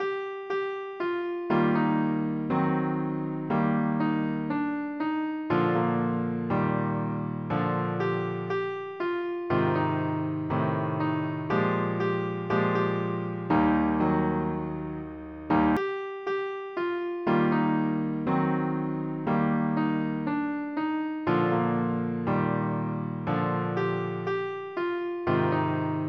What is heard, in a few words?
piano fast; PlayScore version